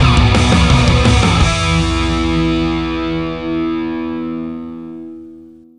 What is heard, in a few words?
Mission Complete! Jingle; The Simpsons Hit & Run; ps2; Burning Rubber